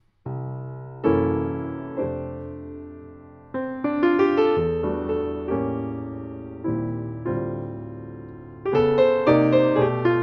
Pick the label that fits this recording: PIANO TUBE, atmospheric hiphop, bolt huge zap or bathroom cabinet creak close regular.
PIANO TUBE